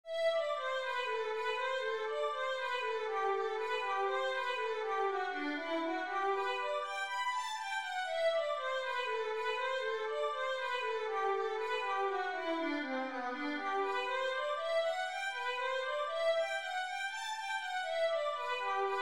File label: etude, violin